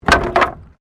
Wood Fall

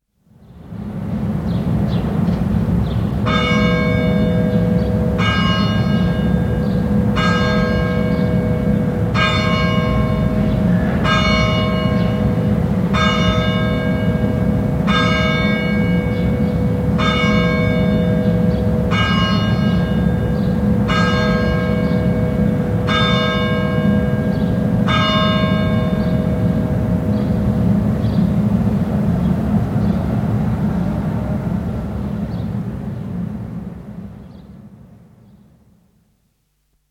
Church bells, church clock strikes 12; St Marie; France; Church Sound Effects; Churches; BBC

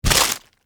Splat Crunch Heavy; Texture; Splat Sound Effects; Objects; SoundEffects-BlastWave

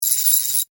Industry Ratchet Turn; Hand Tools

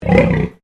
Animal Pig Landrace Inside Grunt; Edgar; Edgar's Mission Farm Victoria; Pigs; Animals

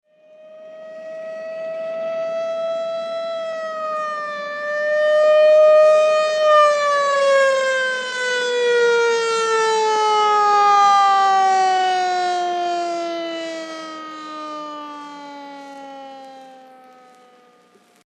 Police Siren Approach Fade; Emergency Vehicle Sound Effects

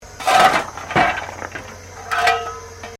Steamtrain Shovelling Coal; Bellarine Tourist railway vehicles

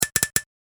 A Series Of Clicks

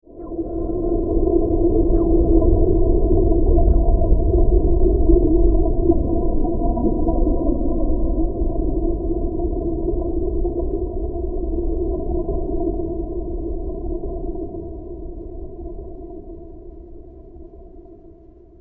The Underwater Cavern, scary soundscape

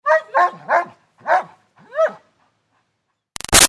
Dog German Shepherd Barks Pants; Dogs; Animals; BigRoomSound

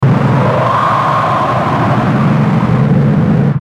Noise Overdrive Harsh Wash; Electrical; Blastwave

